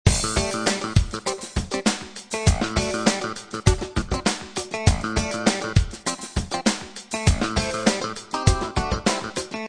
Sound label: Coolgroove Clav